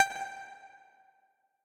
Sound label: Tessellation Move F; Mixolumia